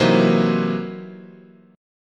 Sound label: C 7th Sharp 9th Flat 5th, chord, guitar